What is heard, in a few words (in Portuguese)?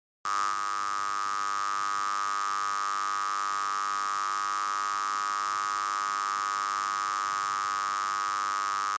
Ruído